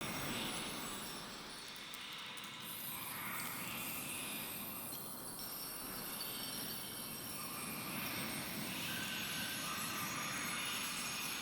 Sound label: Energy Restore Grace Loop